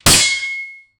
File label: a scene a warrior slams, thwack